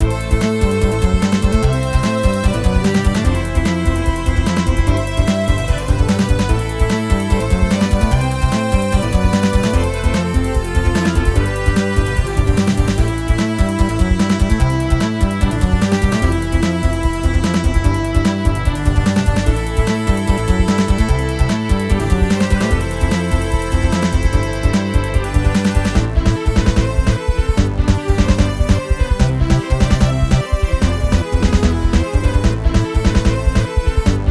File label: deli shop quartet